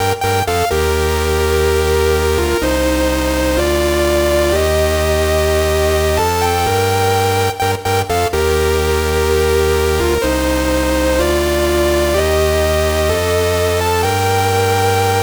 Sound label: 126 synth loop Gmin; bear